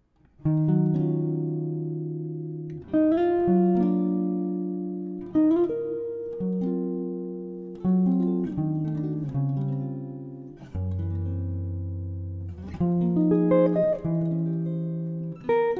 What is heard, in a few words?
17" Swiss Spruce / European Maple Archtop; Jay Carlson Playing a 17" Swiss Spruce / European Maple Archtop